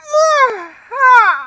toad yawning; sm64coopdx; sfx custom toad

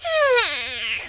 yawning; sm64coopdx; char-select-extra-chars; yoshi

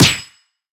lightbulb hit; HeavenStudioPlus; karateman

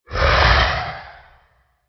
phantom hurt; mob; biomesoplenty

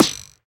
hit Forth Close, air Rally; HeavenStudioPlus

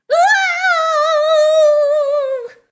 waaaooow; daisy; sm64coopdx; char-select-extra-chars

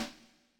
fill; Heaven Studio Plus; night Walk Agb

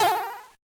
jump; Heaven Studio Plus; tram And Pauline; jump R2

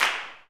way off; HeavenStudioPlus; lockstep

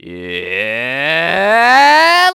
start; Heaven Studio Plus; airboarder

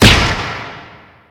bomb Hit; HeavenStudioPlus; karateman

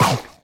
chomp donut; HeavenStudioPlus; blueBear

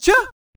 scratch "hey" loud; HeavenStudioPlus; djSchool

